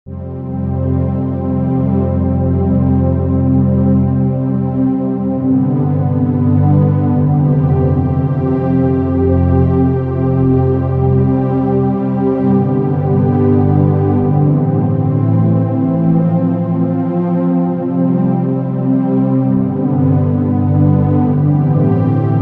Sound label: Underscore PAD; Worship Flow